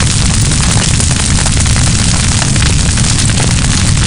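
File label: SFX ambience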